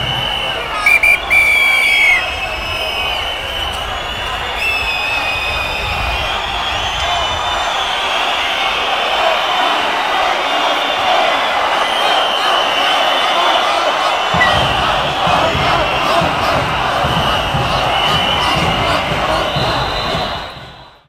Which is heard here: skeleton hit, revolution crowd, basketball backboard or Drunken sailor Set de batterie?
revolution crowd